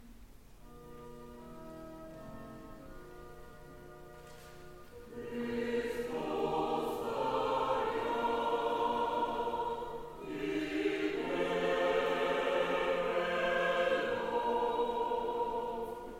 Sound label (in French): Hymne de la Résurrection Choeur de l'Eglise arménienne orthodoxe